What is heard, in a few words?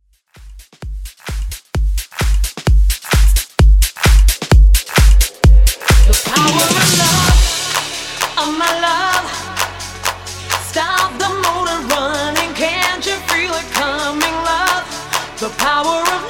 90s Extend, 130BPM